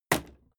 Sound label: impact sweetener wood medium